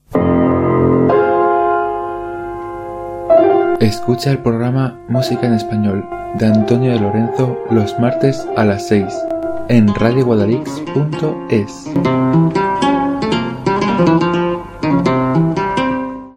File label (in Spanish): Cuña musica en español; Radio Guadalix